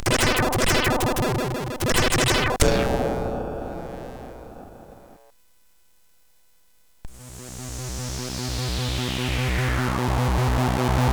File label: An SFX Song sid CSG8580R5